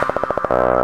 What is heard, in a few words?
Dance Mega Synth; Best Service; 90 s Sample CDs